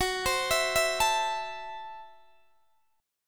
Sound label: Gb Minor 7th Flat 5th, Guitar Open D; down; chord shape